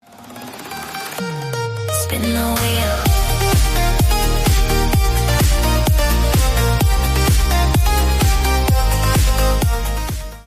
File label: spin the wheel edm